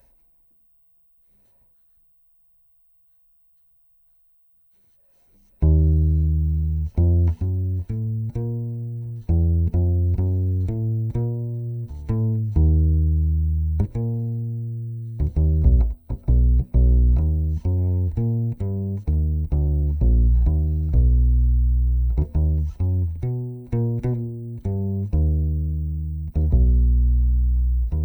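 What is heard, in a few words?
Contrabass DI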